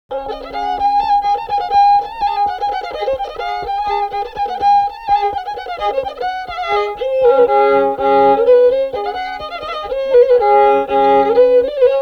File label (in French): Bourrée; Bourrée De Lachaud